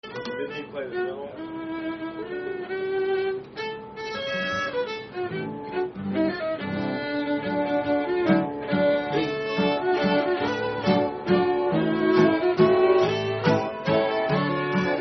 Waltz D; Nameless Waltz

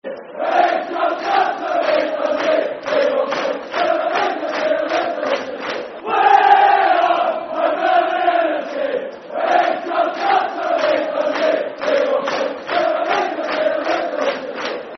Football Chant (Soccer Song), Hibernian

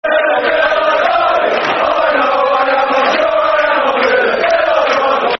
Villa Till I Die, Football Chant (Soccer Songs); Aston Villa Avfc

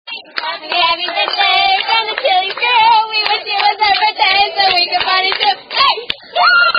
Happy Birthday, Happy Happy Birthday; Chili's

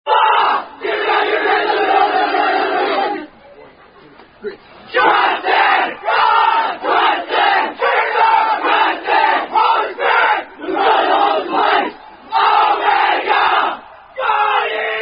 Alpha Omega Cheer, Fraternity Chant; Unknown Fraternity Chant

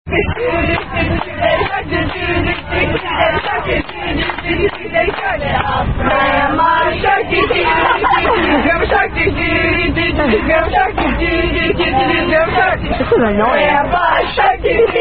Attack Song; The Shark Song!

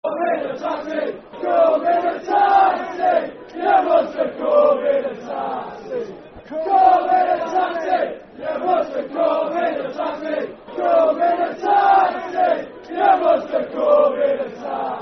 Come In A Taxi, Football Chant (Soccer Songs); Darlington Dfc

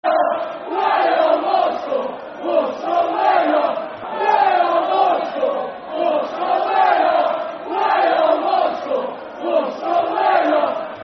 We Are Walsall, Football Chant (Soccer Song)

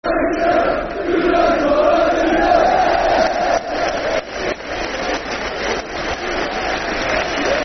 Football Chants (Soccer Songs), Cardiff City

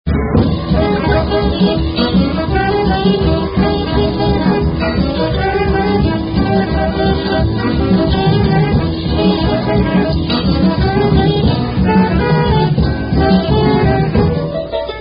Instrumental

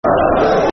Football Chant (Soccer Songs)

